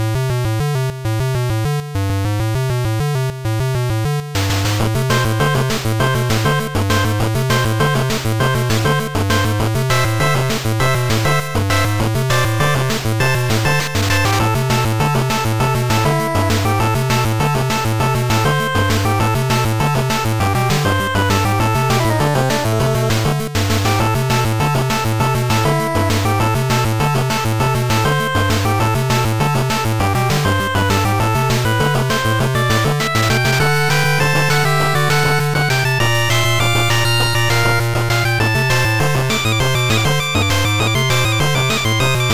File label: 8bit Step On The Gas